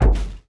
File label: damage hits explosions; World Of Warships